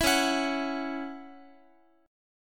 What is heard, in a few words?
C sharp Minor Double Flat 5th, Guitar; strummed; 4A,4E,5A,5D,5G#,6C#; 19,21,21,x,x,x